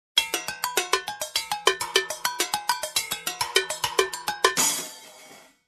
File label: Playing Steel drums and instruments